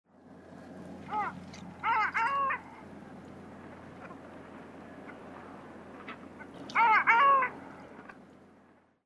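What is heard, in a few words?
long-tailed duck, nemuro; koe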